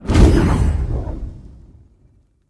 Laser Rune Activate Burst; Runes

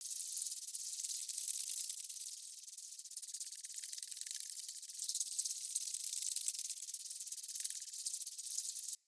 Corrupted Critter Loop, Ambience

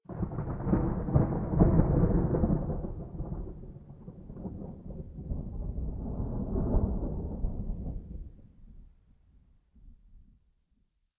Night Rolling Thunder; Night One Offs; Ambience

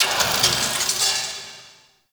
Distant Machines; Ambience; Warframe; Corpus Railjack

